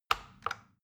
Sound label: plastic button tiny click regular slow